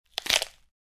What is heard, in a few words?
Body part or joint breaking